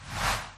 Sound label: shuffle; Candy 3D HTML5